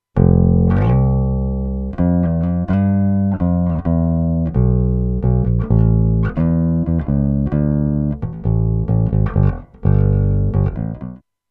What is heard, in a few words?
1st 10mm PIEZO